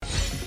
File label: ring